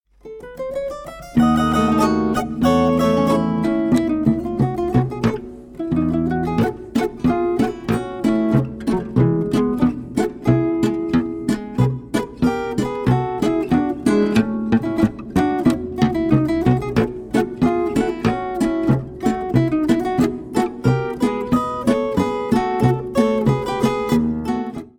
zither

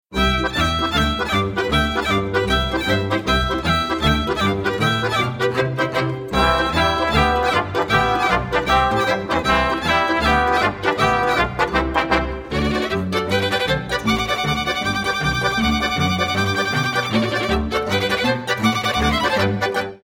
sau polka

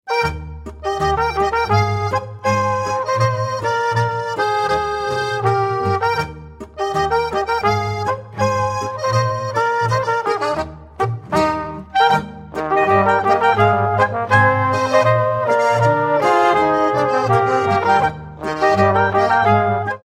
boarischer; burschl

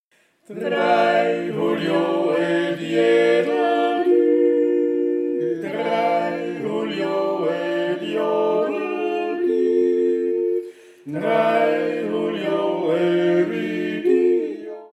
mitanand jodler

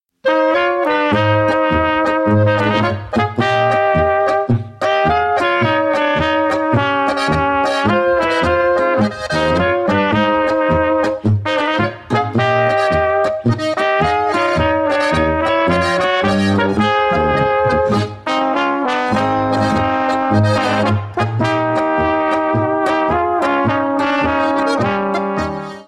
Polka; sommer

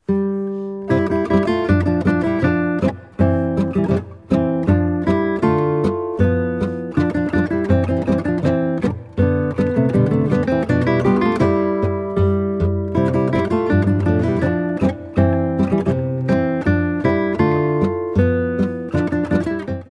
boarischer

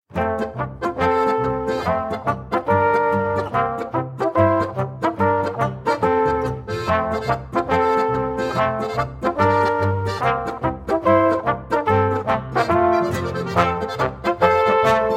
polka

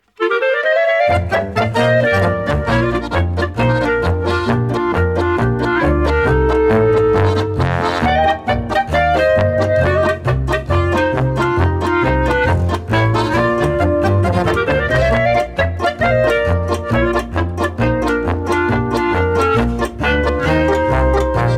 groovebox

